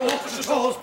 orchestra stalls

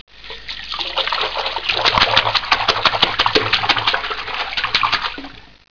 water

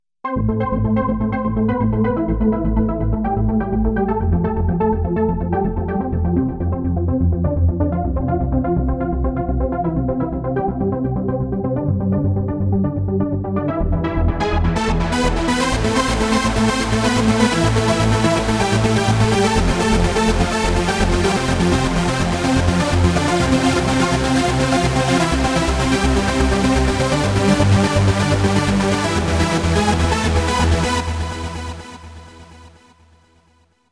3 layer saw

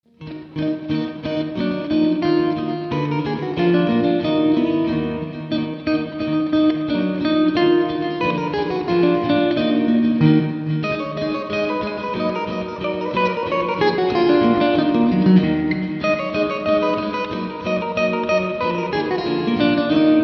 classic trio

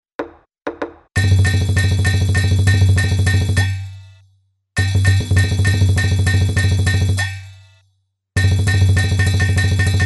Liondance; kungfu

